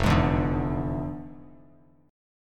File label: F Minor Major 13th, Bass Standard; strummed; JGuitar